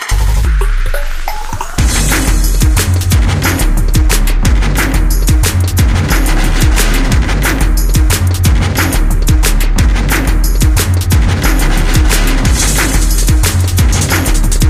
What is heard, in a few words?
bad remix; sven